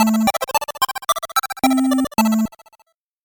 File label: 8bit jingle normal